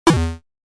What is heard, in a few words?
negative